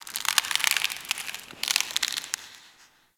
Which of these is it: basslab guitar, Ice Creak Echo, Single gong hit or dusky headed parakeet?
Ice Creak Echo